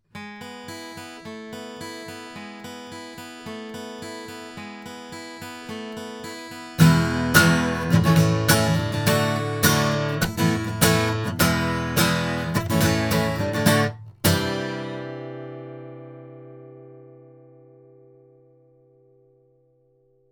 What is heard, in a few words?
Blue Bluebird guitar